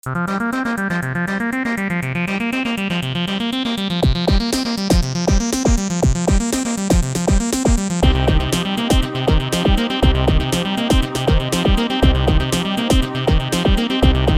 Yamaha QS300 Dance Hip

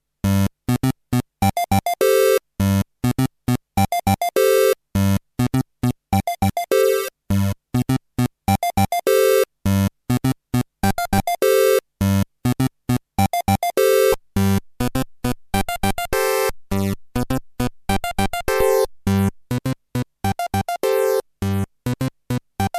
Liven 8bit warps engine

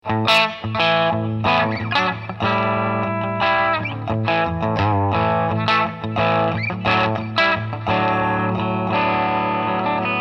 Clean Channel Crunch

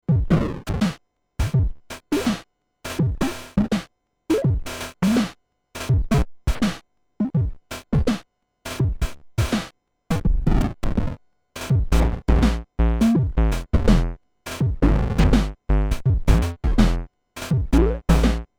Twisted Electrons hapiNES L Random Percussion

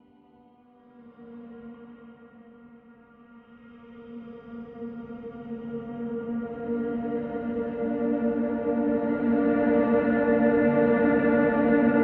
Eventide Blackhole Swell

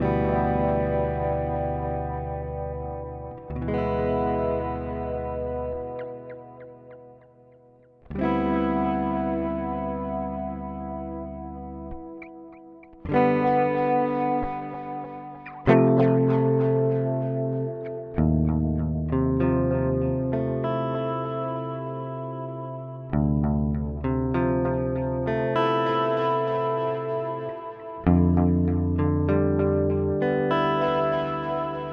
Amplitube X-Time Analog

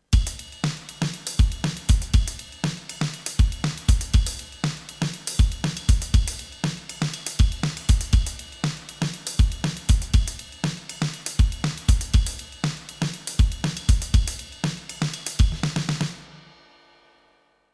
Drums Synchron Stage; VSL MIRPro3D

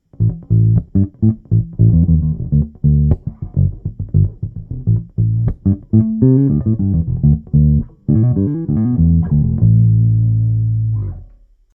Pure Fingerstyle Oldschool Mic, Bass; Markbass MB58R CMD 102; Oldschool66

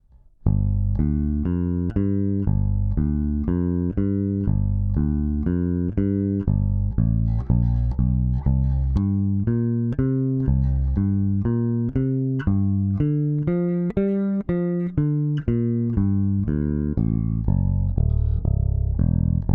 AER AMP One Two Three Compression heavy

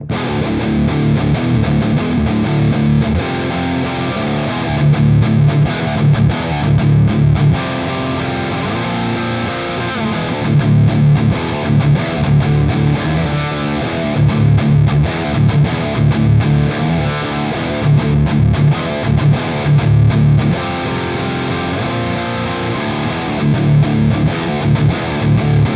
REVV G20 High Gain

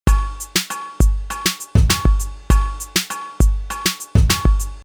basic Loop Elektroset

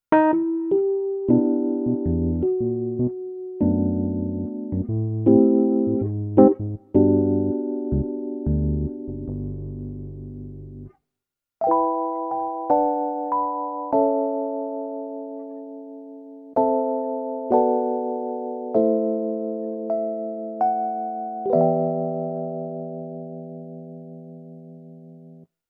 Korg Liano E-Piano

